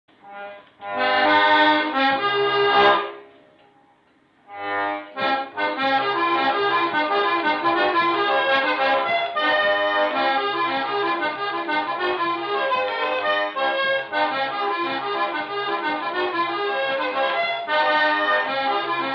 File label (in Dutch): Jig gespeeld archiefopname; Sally Sloane